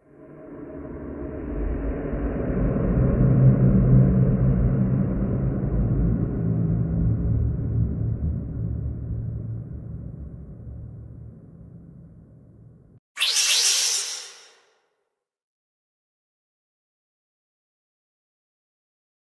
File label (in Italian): suoni horror paranormali